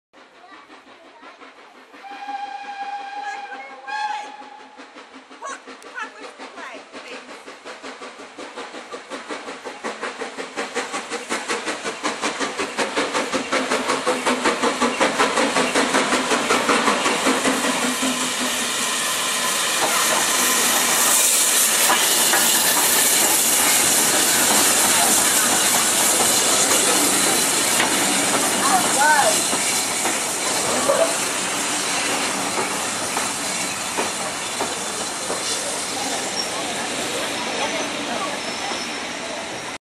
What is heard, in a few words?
train audio